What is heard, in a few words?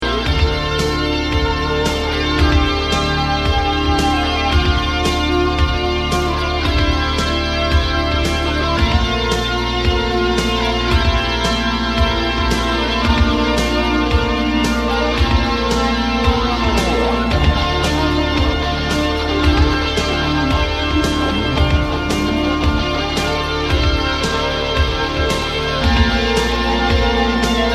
Quick Jam, guitar